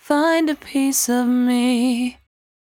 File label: 74-5bpm B, Velvet Vocal; While U Could; Zero-G